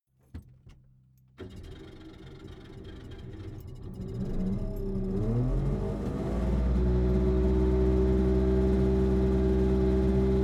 car saab 92 startup rpm low interior

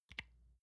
lotion large lid close soft; berufe; friseur

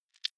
click noise, signale

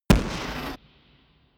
gun pop gun shot; schusswaffen

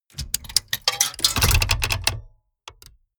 mechanics medium rattle clatter; industrie wirtschaft; mechanik

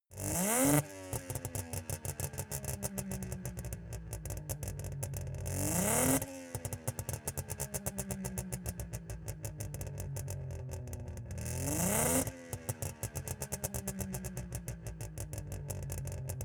car saab 92 rev slow exhaust

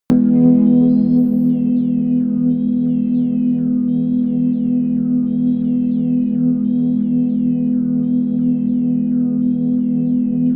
soft organ chrips; flaechen

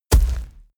hellhound step; schritte

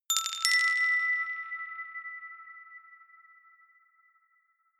notification glockenspiel rattle fifth up; instrumente

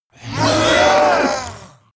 monster monster scream; schreie

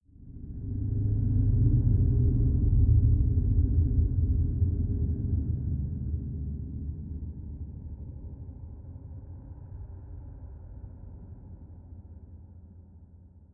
low airy rumble, Drones; musikelemente

